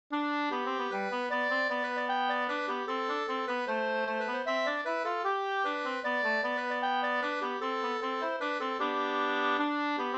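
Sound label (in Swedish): vals, two-part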